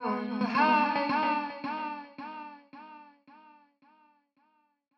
110bpm E, Vocalpack

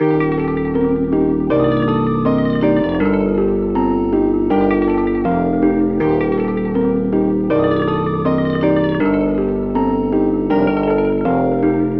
Nostalgic Melody Loop - 160 BPM G Min; Cymatics; 2020 Melody Collection

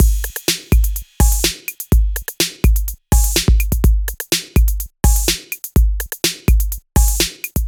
Drum Loop - 125 BPM; Pepper; Cymatics - ANALOG EVOLUTION Beta

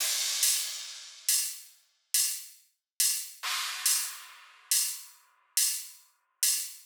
Riddim Drum Loop 140 Top

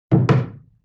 Wood Hit

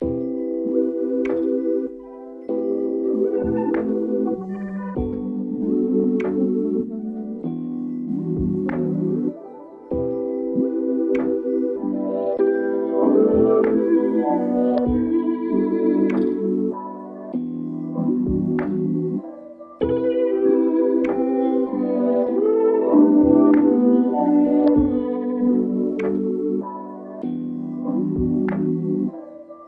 97 BPM E Min, Melodies; Cymatics; 2022 Melody Mystery Expansion

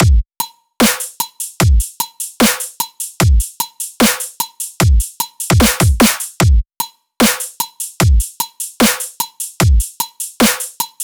Full Drum Loop 150 BPM, Dubstep; Drums; Loops - Full

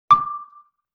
Xylophone Single Note D; Vintage Cartoon; Musical Elements

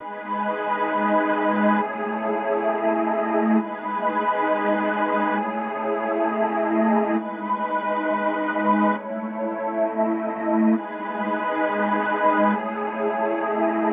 134 BPM C# Min Pad; Melody; Loop Stems; Cymatics; 2022 Melody Collection - Wavy; Skyline

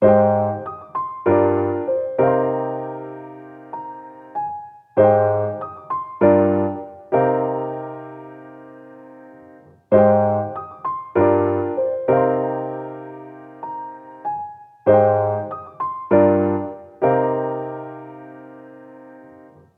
Classic Melody Loop - 97 BPM C Min Piano; Cymatics; Oracle Sample Pack

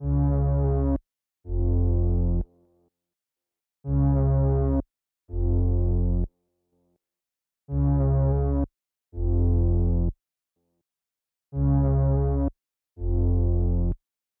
125 BPM F Min Bass, melody loop stem; Trap; Loop Stems; Cymatics; 2022 Melody Collection - Trap Pt 2; Suicide Doors

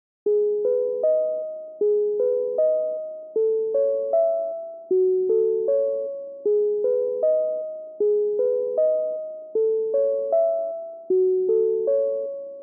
155 BPM G# Min Jupiter-8 Pluck; Loops; Loop Stems; Cymatics; Fantasy Synth Sample Pack; Fantasy - Purple

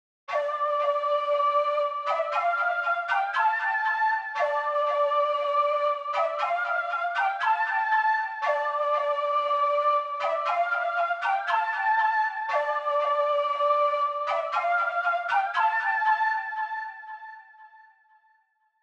118 BPM D Min Flute, Loop Stems; Melody; Melodies; Cymatics; 2022 Melody Mystery Expansion; Sacrifice

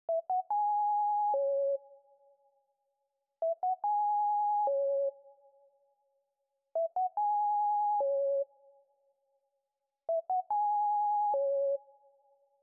144 BPM C# Min Sine, loop stems; Melody; Cymatics; 2022 Melody Collection - Wavy; Playboy

